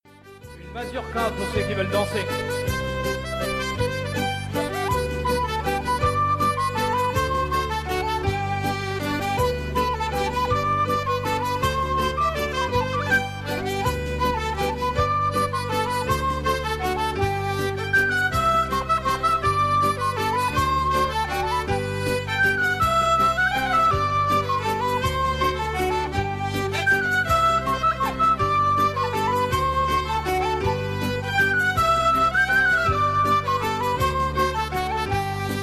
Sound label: mazurkas